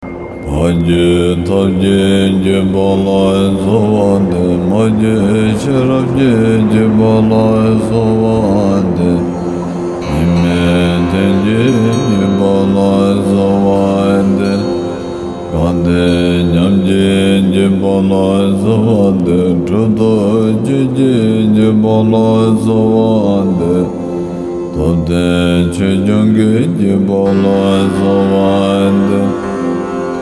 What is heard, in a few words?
Chö Lineage Prayer; buddha within yourself